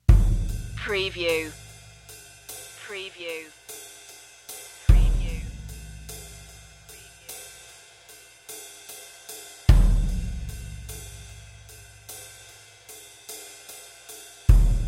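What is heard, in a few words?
drum and bass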